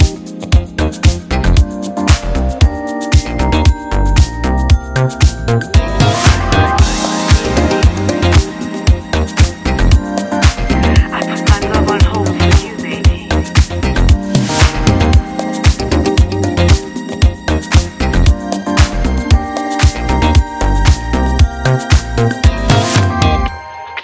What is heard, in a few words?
Extended Instrumental